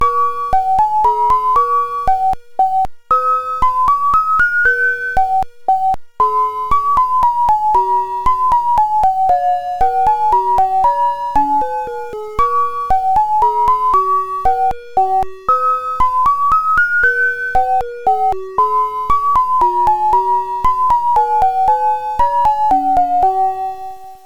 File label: sine tri